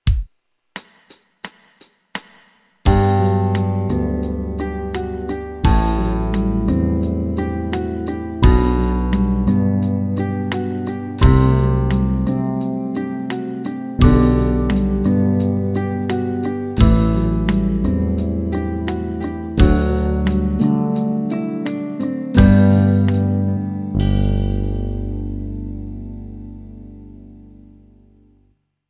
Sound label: Pedaling The Scale Slow, piano